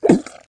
mud

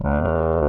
Voice, beast or animal

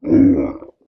roar, creature

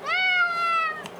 Cat Meows - Food Time; Micro Pack

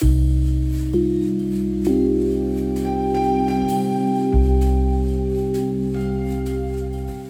Scintellating Pad; BB 2HTC Samples Vol 4; Pads

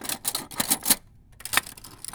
Silverware; BB Retail Therapy Sample Pack

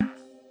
Melodic Thunk; Solos; BB 2HTC Samples Vol 4 Addendum

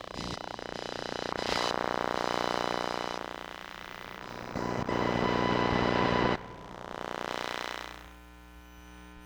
Computer Monitor, Electromagnetic Sounds; Micro Pack; NazdyNate

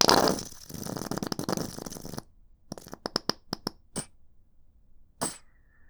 Plop Sputter, Bottle Plops

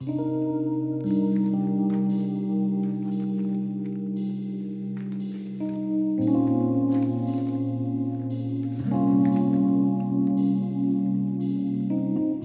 Chill Rhodes; BB 2HTC Samples Vol 4; Pads

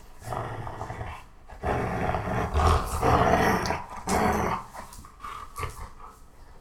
anger dog

